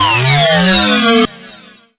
Internal Emergency

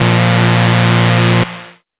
Evacuate

